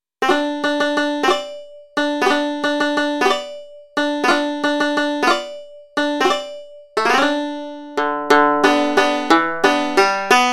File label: Waltz